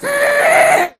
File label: scream, ghast; mob; Minecraft